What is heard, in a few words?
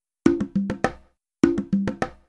Latin Percussion; 05 Percussion